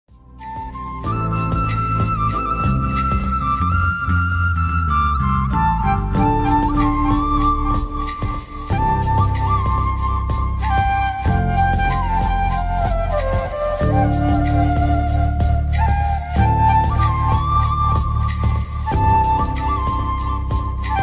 flute ringtone romantic ringtone

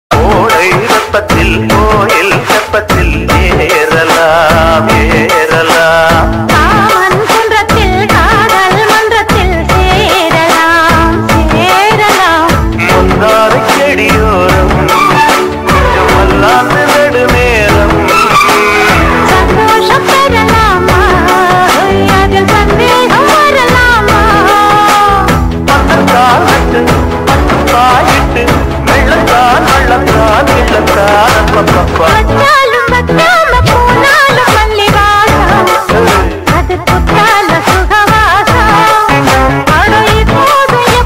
bgm ringtone song bgm ringtone; dikkiloona movie

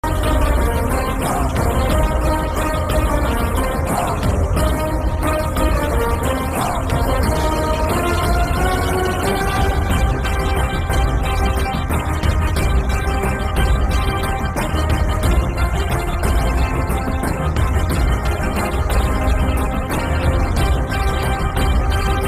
Lyrical Song Bgm Ringtone Mass bgm; kanguva

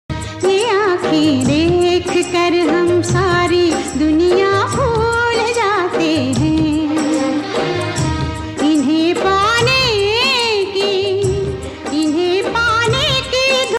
beautiful background music

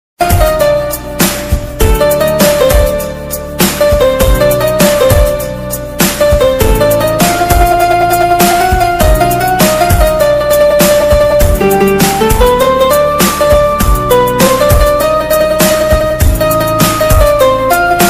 instrumental music ringtone; ganpati bappa ringtone tune

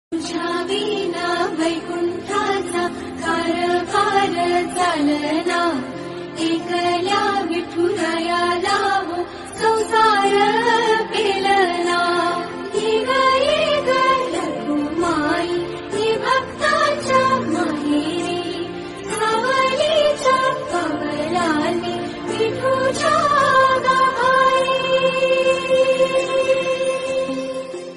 vitthal ringtone, marathi ringtones

